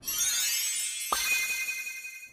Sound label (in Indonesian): Nada Dering Anime Sparkle Pop